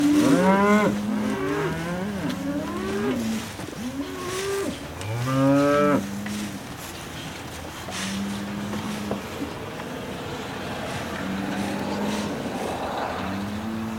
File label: Cleaning out the cow shed